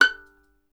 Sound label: STR VLN, Solo Instruments; Miroslav Vitous